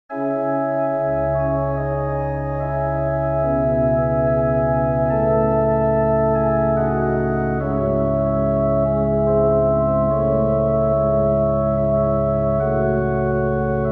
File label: chorale in c sharp minor